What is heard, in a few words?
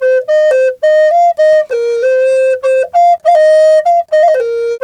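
flute, 100 BPM; Didgeridoo and Other Primitive Instruments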